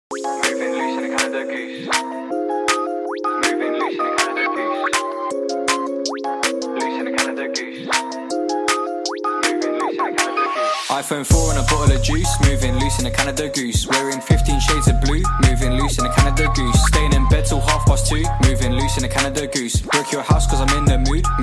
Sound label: ringtone, english